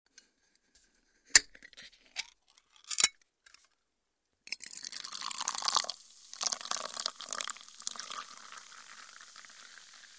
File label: open a can of soda pour into a glass